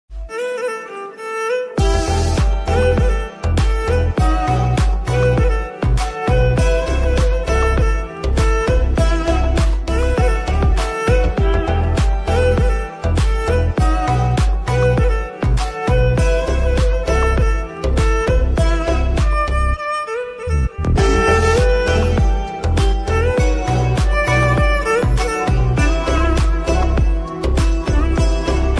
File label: violin theme bgm ringtone; leo climax; thalapathy vijay